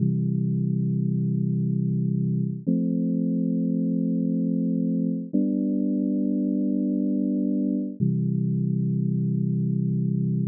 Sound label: chord cadence